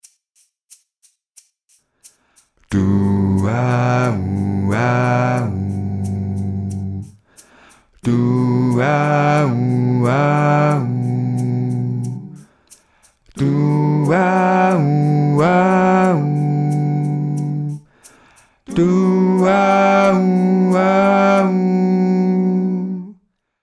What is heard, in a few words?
Duauau Maj7 Bass